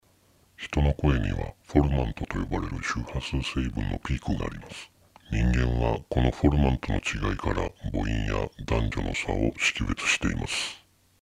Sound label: formant test